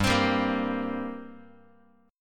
G Minor Double Flat 5th G, Guitar, 4A, 4E, 5A, 5D, 5G sharp, 6C sharp; strummed; x,3,3,5,2,x